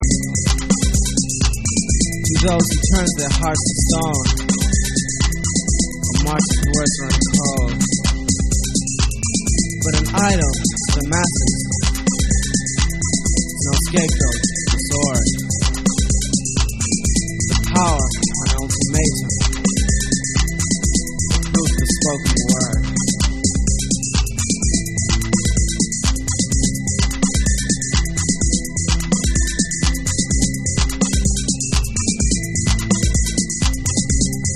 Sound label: Distant Drums